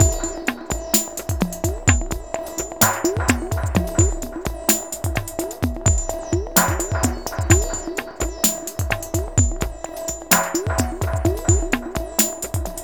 Ethnotronic, Processed Percussion

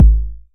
909 BD; Goldbaby Tape808 & Tape909; The Tape 909

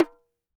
CLAY FRAME, CAMEROON; AKAI S6000 CD-ROM; WorldSounds

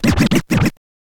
scratch short, Vinyl scratches

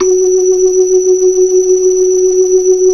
78 BLOOD R 2, ORGAN; Keith Emerson Signature Series

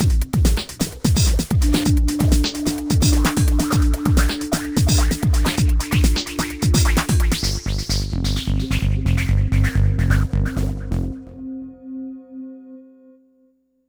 Hardcore Breakbeat, 129 Cmin; Big Fish Audio; Hardcore Breakbeat Action

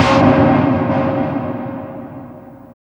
POTS N PANS, SPACEY DRUMS; Spectrasonic Distorted Reality 1

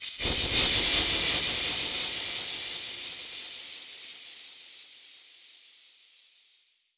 Perc Hit, dub percussion; musicradar

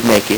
LSDj, Nintendo Gameboy; 300 Drum Machines; Ghetto

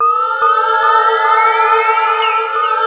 AMBI PAD

